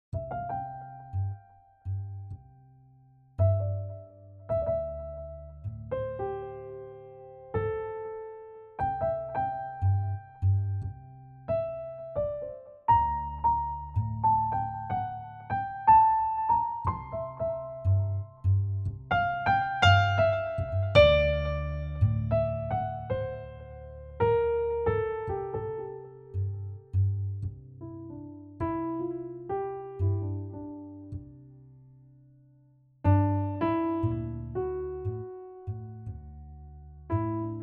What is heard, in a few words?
piano groove